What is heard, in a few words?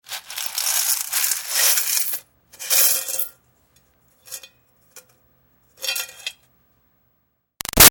Foley Coins Dump Out Metal Bucket; Money; Objects; BigRoomSound